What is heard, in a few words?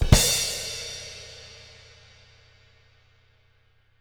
DBLCW KIK -R, Living Drums, SD CYMBALS; Sampleheads; Peter Erskine